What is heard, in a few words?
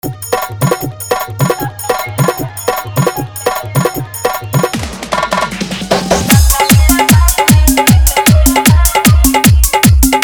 Dhollki Bass Mix